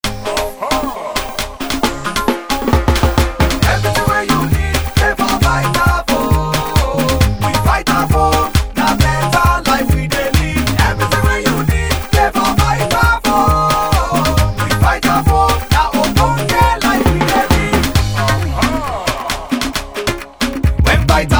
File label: Theme Jingle Pidgin; Vitafoam 2014